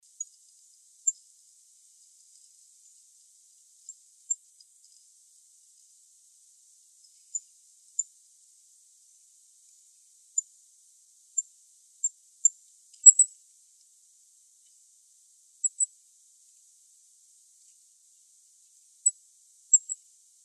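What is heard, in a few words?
goldcrest voice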